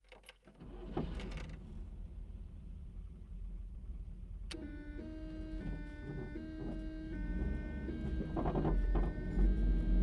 polonez 1500 onbrd start medium drive siren stop reverse stop drive stop off; mix interior; sonniss gdc